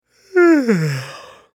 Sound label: Human Male Yawn; Yawning; Voice; SFX Bible